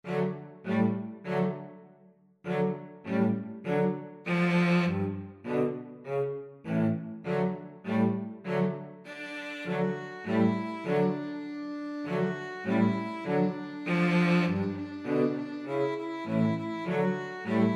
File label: hot cross buns 2 violins 2 cellos